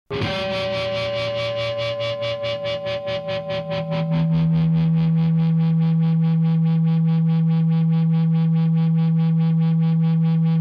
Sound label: perfectly good guitar